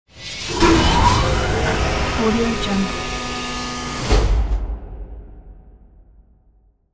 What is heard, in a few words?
futuristic elevator